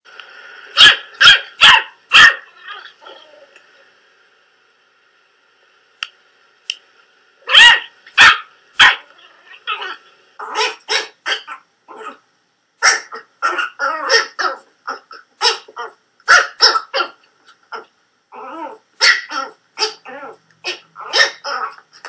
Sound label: Puppies Barking